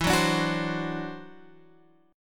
E 7th Suspended 2nd Sharp 5th, E, down, Guitar, Open G